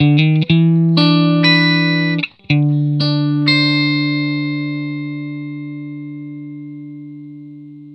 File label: TURN 1 A, Vintage Blues Guitar, 120; USB Soundscan vol.22; AKAI